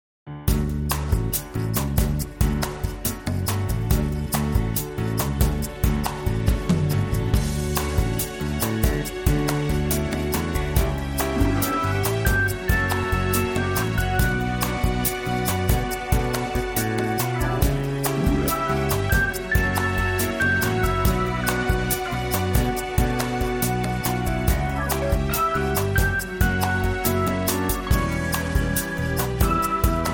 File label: instrumental version; Persona 5